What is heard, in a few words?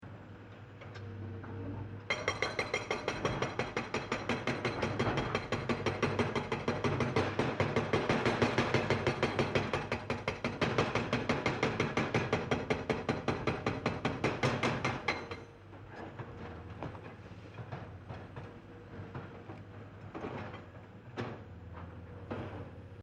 Industrial Quarry Construction Pecker Stone Breaker Digger Breaking Stone Long; Construction Vehicle Sound Effects